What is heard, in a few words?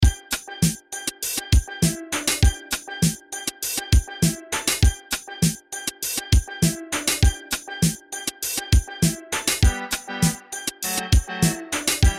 Latin BPM100